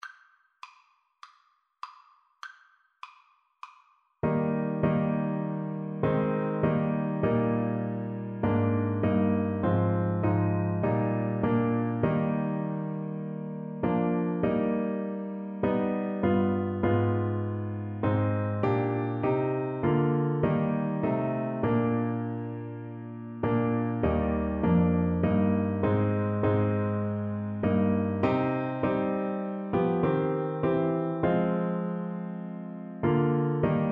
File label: Clarinet